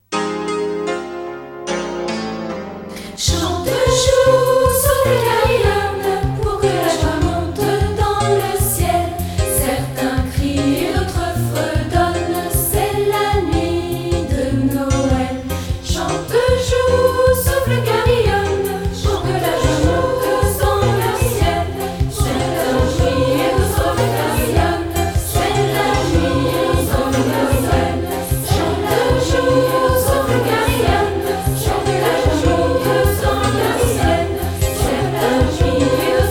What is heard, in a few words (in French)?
Chante, joue